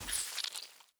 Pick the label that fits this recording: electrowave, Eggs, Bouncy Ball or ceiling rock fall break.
Eggs